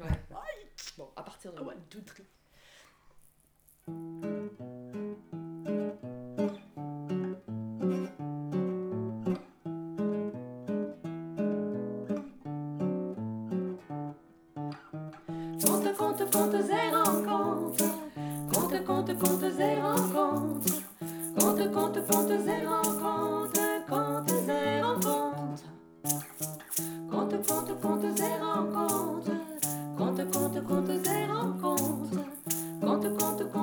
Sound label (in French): jingle contes